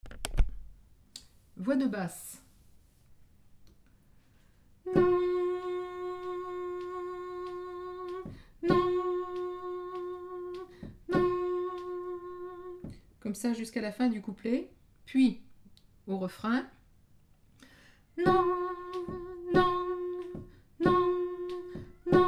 cloche sur cloche basse, chants noel

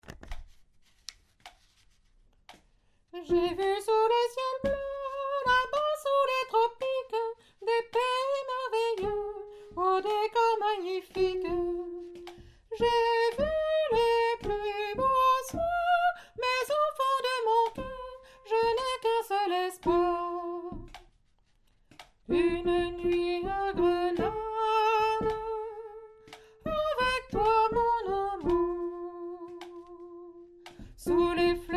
hommes, chorale

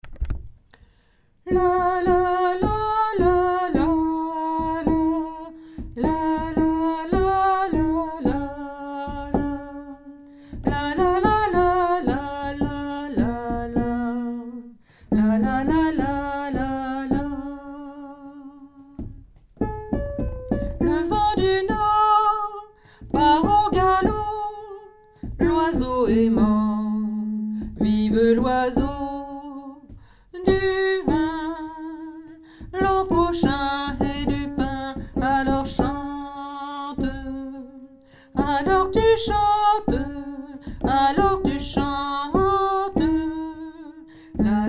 alto, chorale

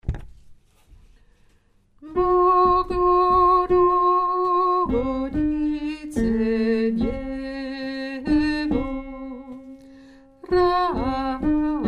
basse; chorale

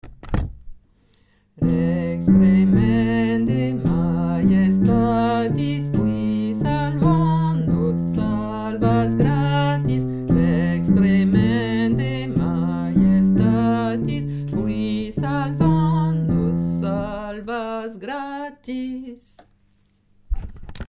numero 4 lettre G basse

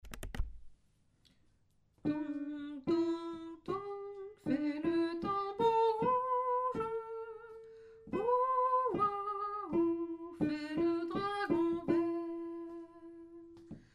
berceuse chinoise soprano

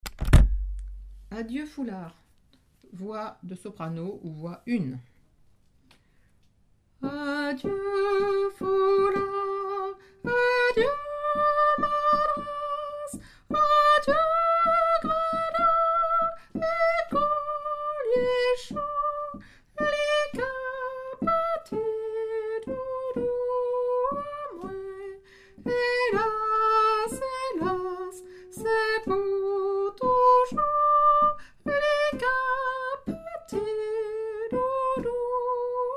adieu foulard voix 3

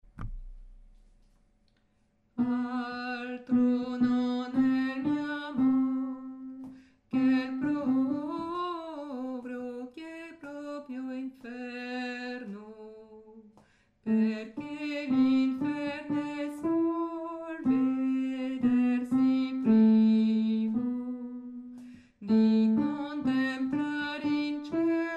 alto, chorale; repetition agen septembre 2024

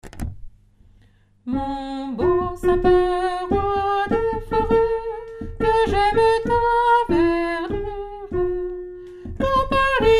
mon beau sapin soprano; nouveaux noels; chants noel

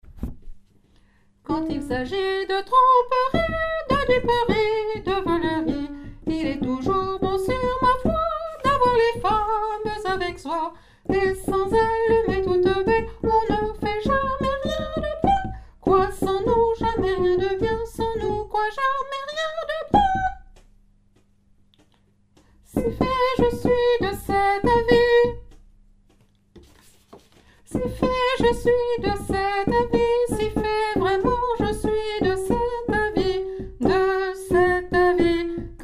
contrebandiers soprano

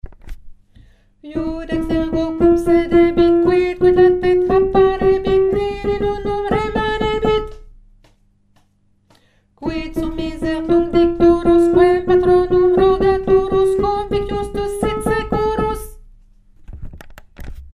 dies irae lettre I basse

